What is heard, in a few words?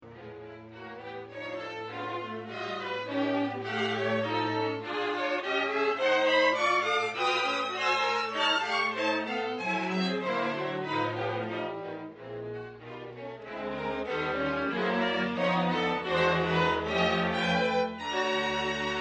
lamento fugue